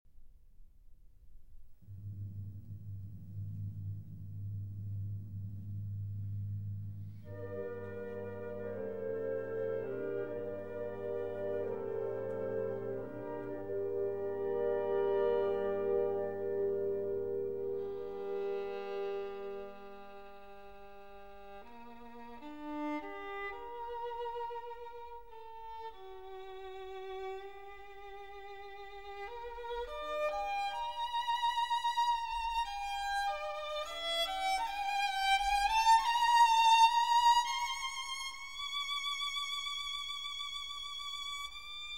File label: Violin Concerto No. 1 in G Minor; Op. 26; Bruch